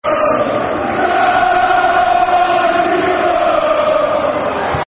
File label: Come On You Royals, Football Chants; Reading; Reading Rfc